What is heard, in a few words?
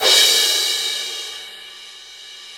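CYM CRA, crash cymbal; Sound & Vision - Gigapack I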